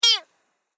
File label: pika; ringtone